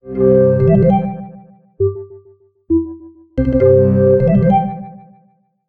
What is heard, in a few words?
Zeta; amazon fire hd 8